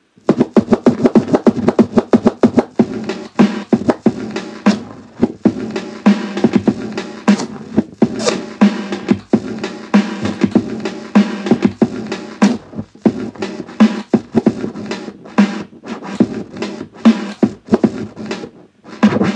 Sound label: beat juggling breaks